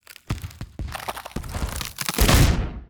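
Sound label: tree freeze rupture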